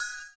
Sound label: CYM, Ethno Techno; ILIO - Ethno Techno